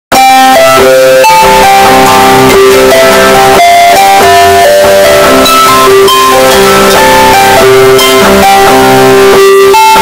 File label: Samsung alarm earrape